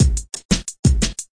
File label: 177 bpm straight thin new orleans, drum loop